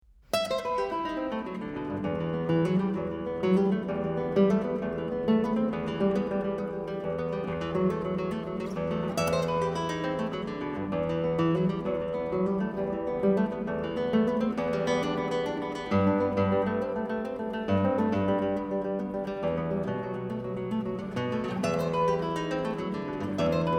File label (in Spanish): guitarra